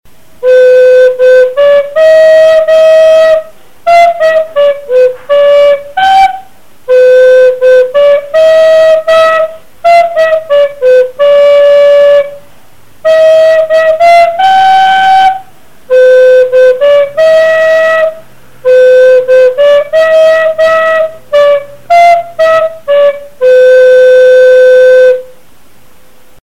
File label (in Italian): FLAUTO